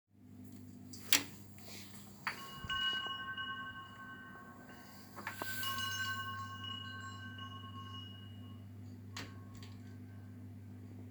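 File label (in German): Windspiel Sprache, wind chime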